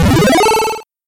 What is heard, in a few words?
launch set; incredible hulk chitauri takedown